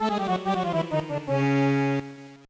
Carnival Minigame Fail; the urbz sims in the city; gba

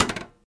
crate

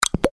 Clack Pop Click; Infernal Radiation

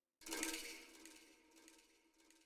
Spring Drums; Frozen Flame; windows

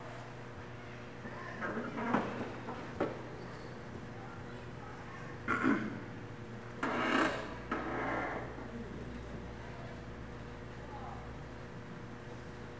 Amb Auditorium Very Quiet Loop; Lucius III; pc linux

